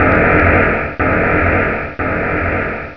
double damage expire; valve; sev powerups

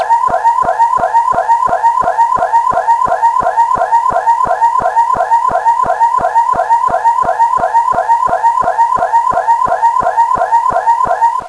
car alarm; cstrike

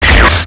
beam blast

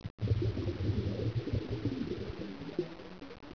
bubl; cstrike

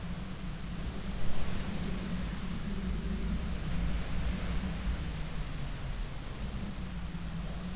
wind light

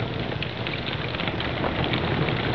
firesound

